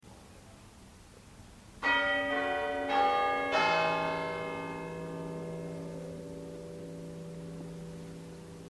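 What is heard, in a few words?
quarter hour, chimes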